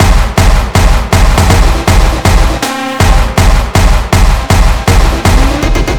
Beats Loop; Anger Foot

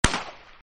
gun sound; Arc of Templar

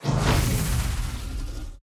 Energy Orb

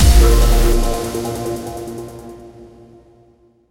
Combat End Stinger; The Boat; mirror's edge gamerip

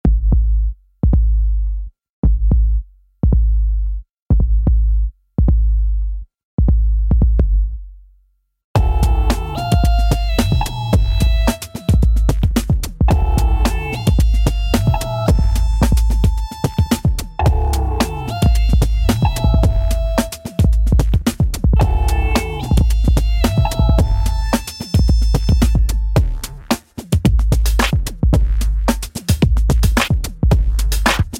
Hip Hop

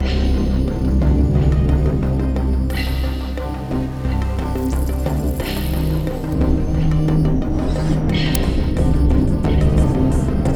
Glitch Theme; There Is No Game: Wrong Dimension

